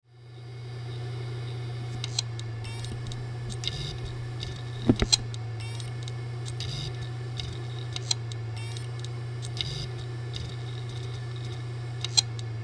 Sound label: HDD Sound